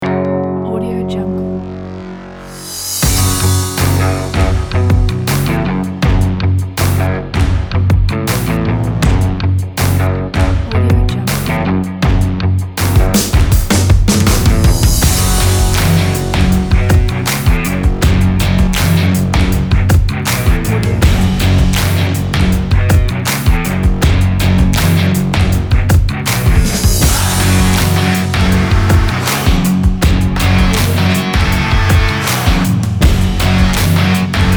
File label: action sport rock kit